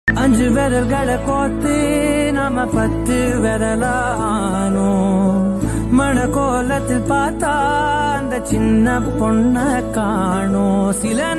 tamil love song; namma veettu pillai

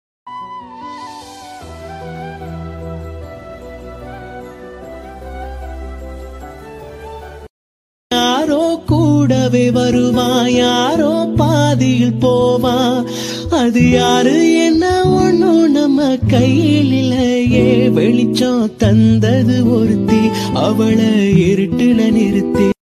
ringtone bgm; varanam aayiram

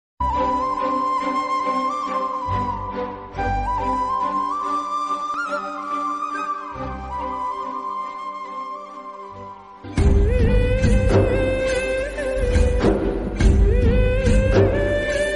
love bgm ringtone; Dhadak movie

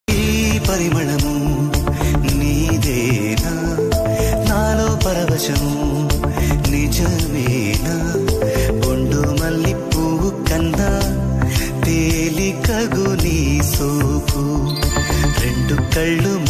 movie romantic bgm ringtone; gharshana; asin

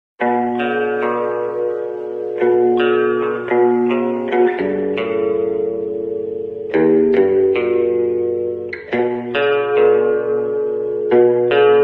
guitar slowed bgm ringtone; light gojo

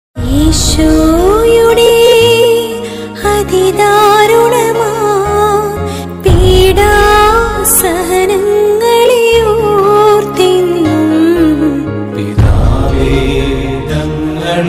Malayalam christian devotional; Divine Media

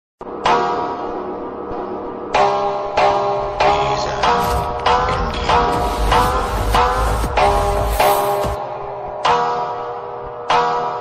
sigma male ringtone ringtone beats

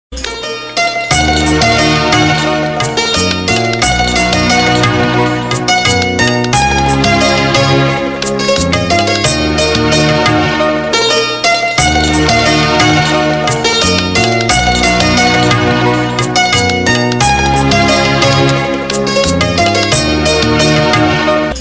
odia ringtone romantic

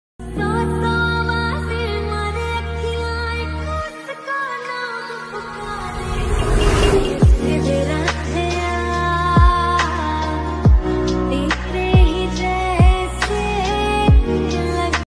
lofi mix

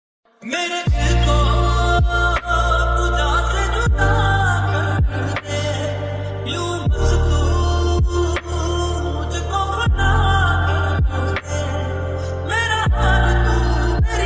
slowed reverb romantic ringtone lofi ringtone

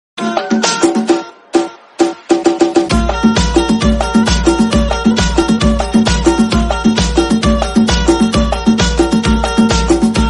tiger track bgm ringtone

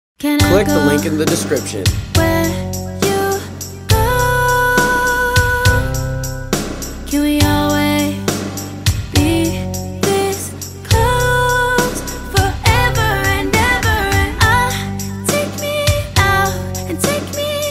lover ringtone

